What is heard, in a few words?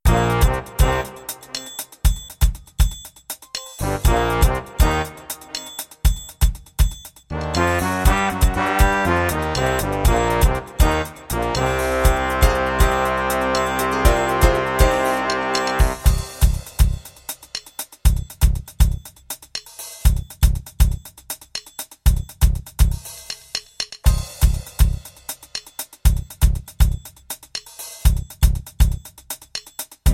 70s Tv Theme, rhythms; mzx500; preview pack 01